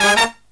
HIGH HIT, Brass Riffs

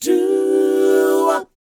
DOWOP A; ILIO; Vocal Planet

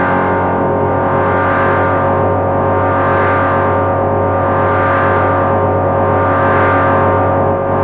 SF DRONE, NASTY RM FX; Zero-G Ambient 1

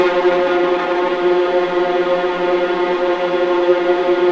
VLA E1 TRM L, VLAS TREMOLO

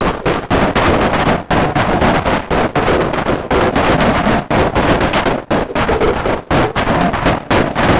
Envelope Beat; Drums & Drones

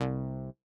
Bass C1, Old Skool House, 120bpm; House of Amnesia; Big Fish Audio; OSH Construction Kits

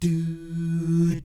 DOO, Vocal Planet Jazz

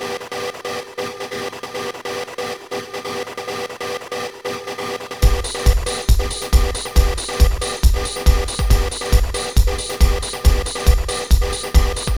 RAVE RHYT, MIXED LOOP; D LOOPS

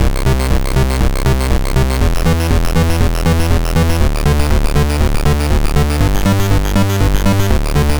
C64 Bass, Commodore 64, Chiptune

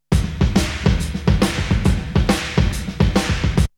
Drumloop; ZERO G - Datafile DISC 2

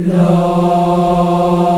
VOX, African Aahs, African Las

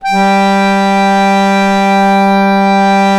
ACCORDION G3; USB Soundscan vol.63; Balkanish Traditions; AKAI

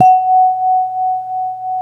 MLT VIBE

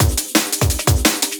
Dungeon Beats 172, breakcore breaks

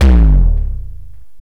BD, 170 BPM, Drum & Bass; Ueberschall; Drum & Bass Flash Trackz

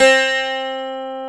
C-3 ON, MINI 12.GTR; AKAI S-Series CD-ROM Sound Library VOL-7

